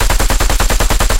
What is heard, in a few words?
loop dt origin; guns tanks